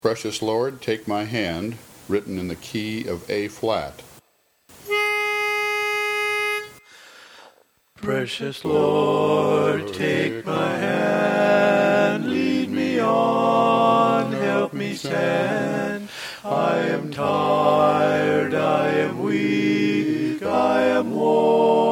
Lead, Learning Tracks

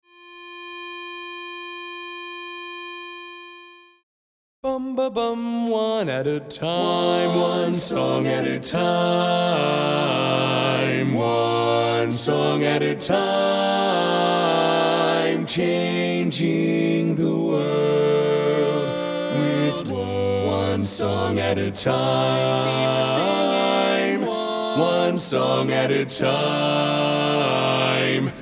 Bass Left, Learning Tracks

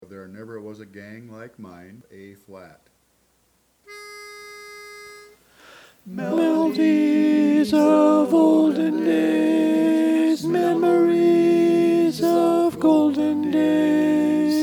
Tenor, Learning Tracks

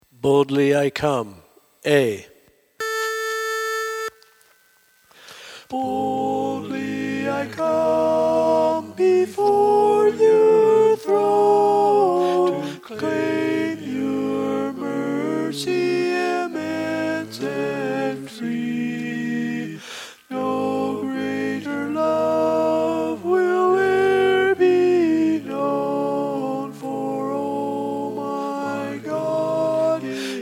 Quartet Tenor

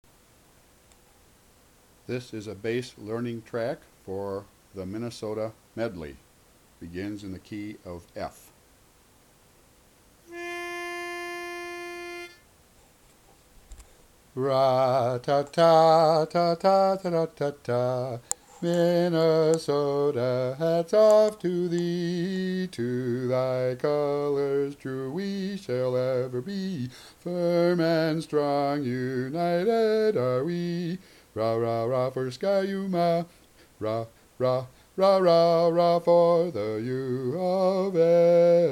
Bass, Learning Tracks

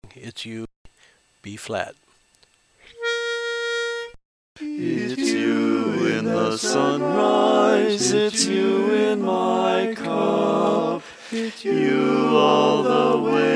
Bass, Learning Tracks

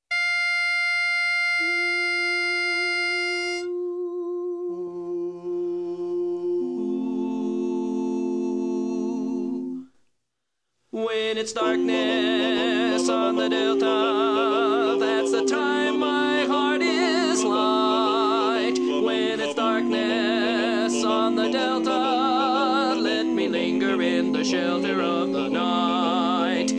Lead, Learning Tracks

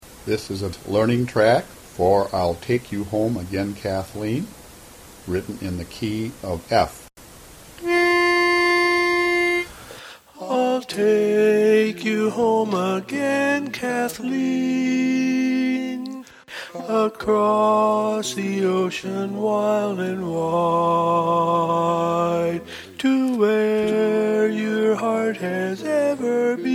Lead, Learning Tracks

